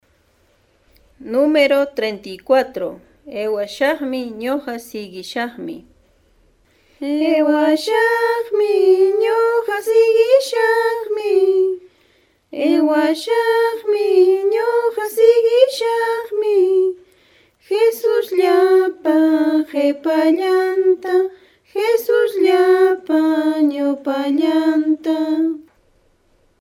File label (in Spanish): cancion